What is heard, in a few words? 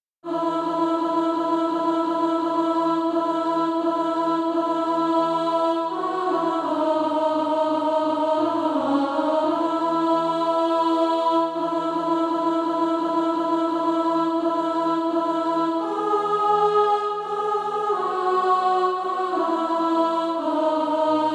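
alto, SATB Practise Music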